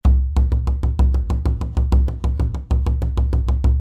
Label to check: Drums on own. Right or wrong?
Right